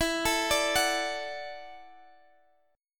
E 7th Suspended 2nd Suspended 4th E down Guitar Standard; arpeggiated; chord; JGuitar